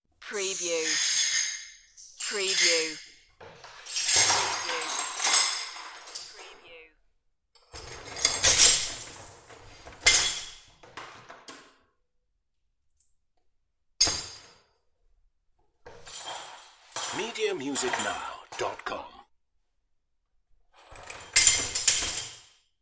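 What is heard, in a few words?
Clinking Glass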